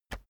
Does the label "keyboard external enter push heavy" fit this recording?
Yes